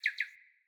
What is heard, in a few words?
Cardinal Male Bird Double Chirp